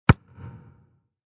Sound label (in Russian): udar po futbolnomu mjachu